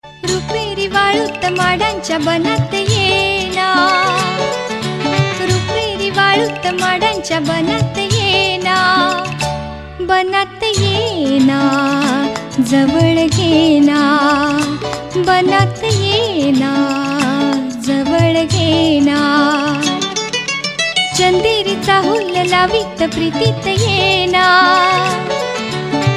Marathi Classic

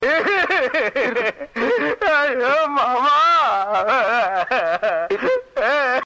Fake Cry

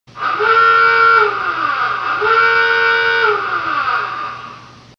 Klaxon

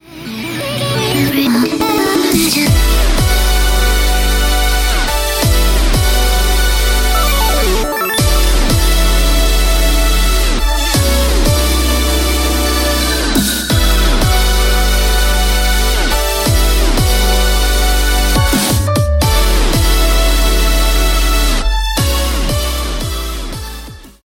Emotional

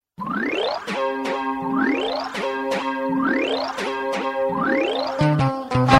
Msg Tone; Chiru

